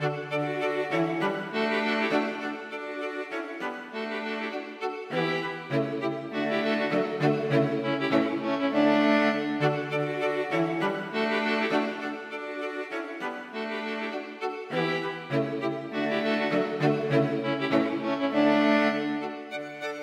Engloise med Menuet for strykekvartett; Collett

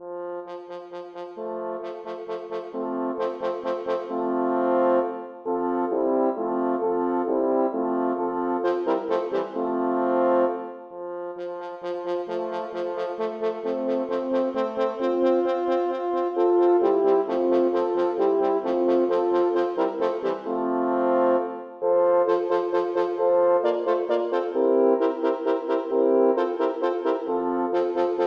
For fire horn

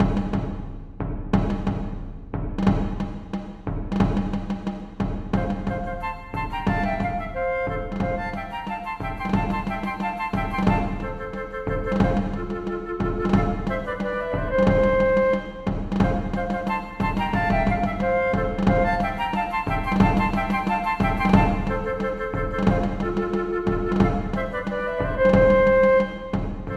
March for fløyter og trommer; Aamodt

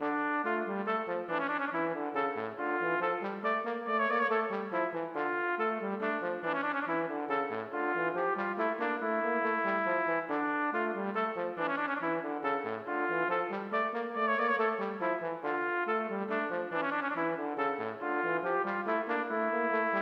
Menuet for trompet og bariton; Aamodt

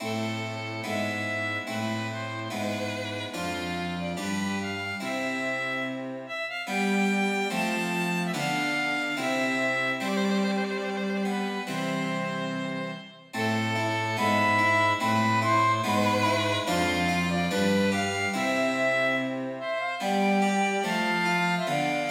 Air for fløyte, fiolin, cello og cembalo

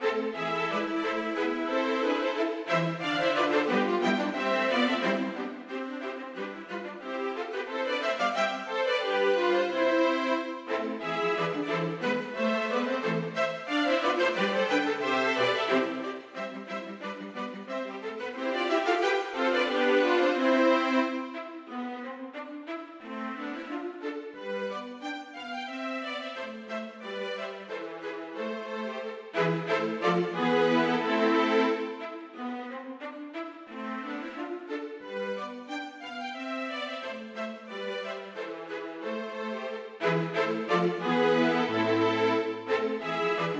Gavott en boure for strykekvartett; Hertz

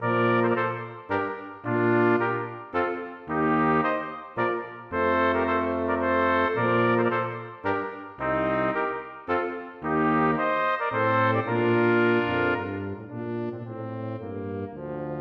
Menuet for messingkvartett m Baritone og Tuba; Aamodt

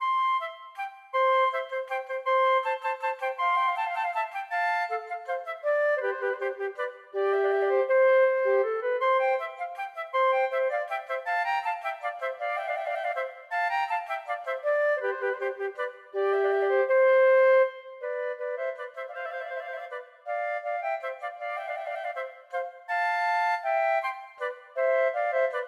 Menuet for fløyte duett; Aamodt

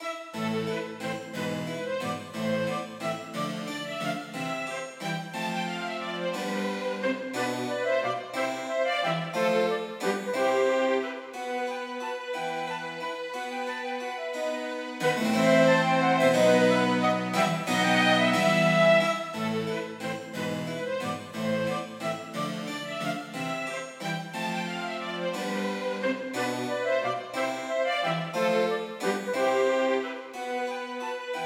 Gigue for fløyte, fiolin, cello og cembalo

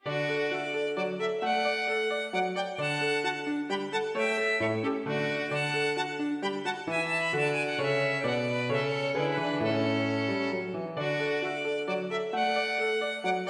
Menuet for to fioliner og piano

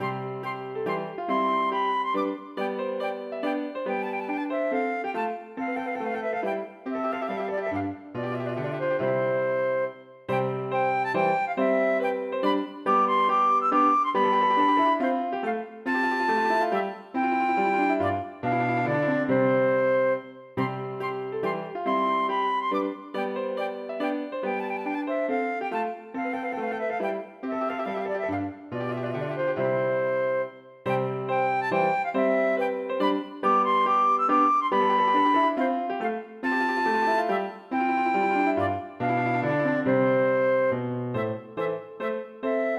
Menuet for fløyte og piano; Aamodt

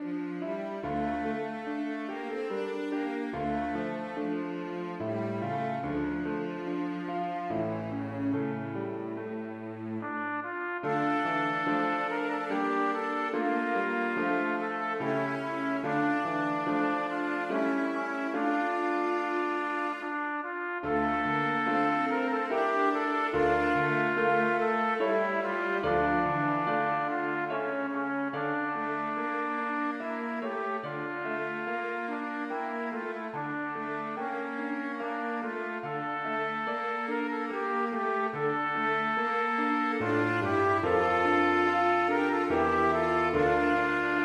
Elisæi historie Anden sang for trompet, cello og piano; Lindeman; Elisæi historie. Anden sang. Etter Ole Andersen Mærli i Sørum 1870.

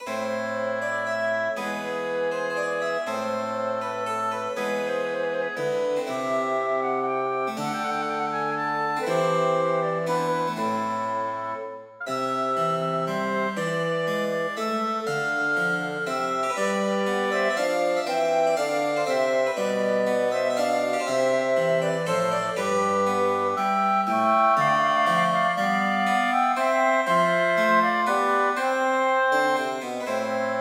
Rondeau for blåsekvartett med cembalo; Hertz

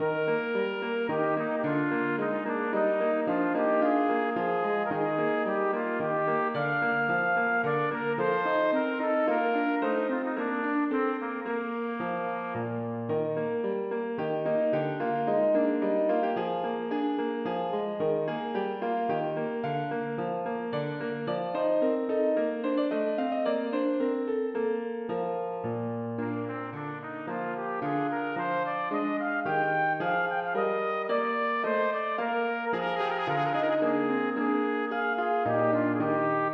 Menue for trompet og piano; Bang

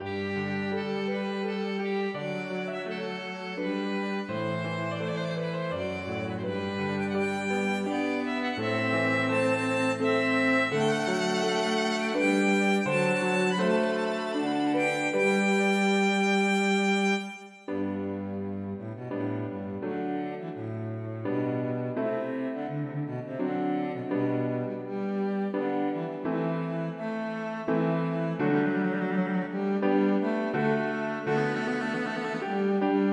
Aria Menuet for fiolin, cello og piano; Aamodt

